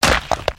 crossbow hit

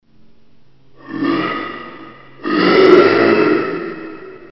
zombie; dod

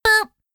boop; tf; a hat in time; hat kid

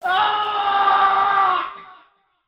scream

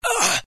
hurt

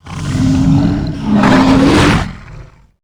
attack; tera creatures; alpine predator

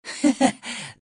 laugh short; Adachi

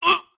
hurt

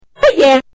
jump; freak fortress 2; mario

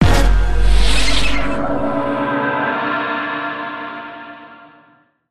pong

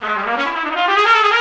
trumpet danger; tf; Advanced Weaponiser

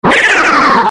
attack; freak fortress 2; luigi creature

